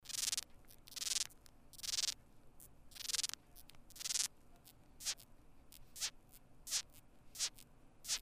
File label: sample call